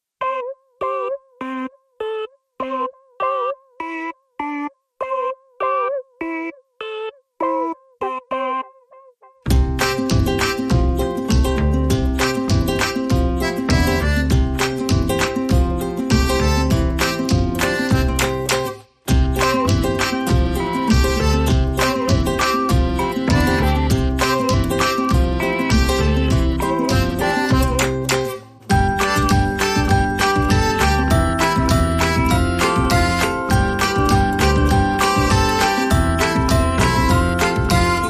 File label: No Annoying Clav Synth